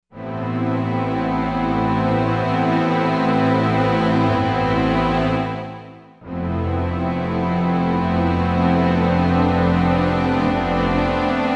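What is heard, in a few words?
Warm Arco Strings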